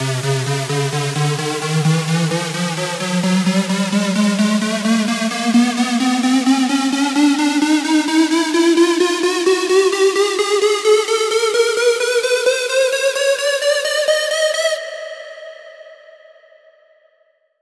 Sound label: Square C Pulse